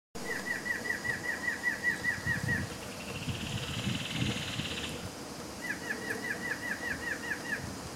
Choca comun, Choca Común (Thamnophilus caerulescens); R Norte

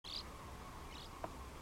Golondrina Patagonica

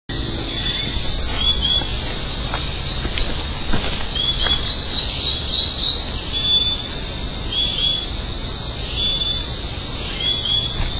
Euphonia chlorotica y Hylophilus poicilotis; Garabi; Jorge La Grotteria

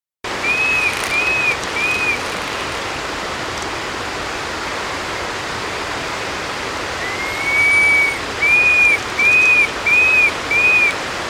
Batara Copeton

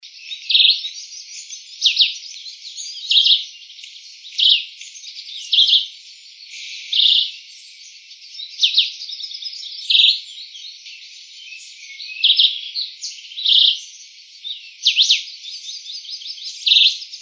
Chivi Comun, Chiví Común (Vireo chivi)

